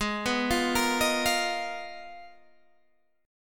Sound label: G sharp Minor 6th Add 9th G sharp Guitar Open G, chord